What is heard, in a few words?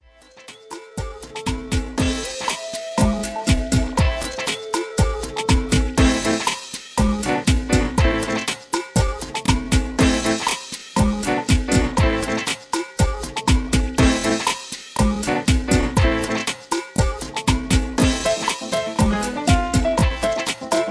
Latin feel